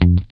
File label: GUI F2, E-GUI MUTED; Sound & Vision; Gigapack I CD 2